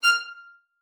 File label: F6, VIOLIN SPICC; Xsample VOL-5